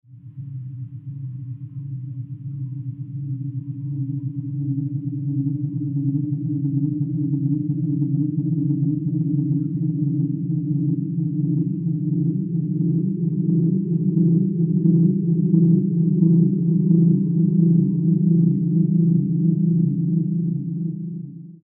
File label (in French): polyester 3mm 30m, aeolian